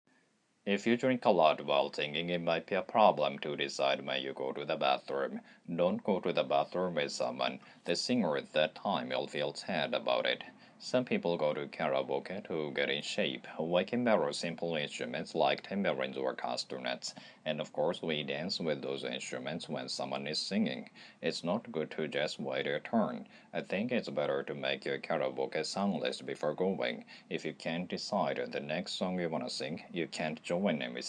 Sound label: karaoke